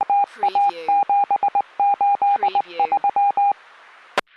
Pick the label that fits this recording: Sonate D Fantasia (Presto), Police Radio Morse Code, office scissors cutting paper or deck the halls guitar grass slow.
Police Radio Morse Code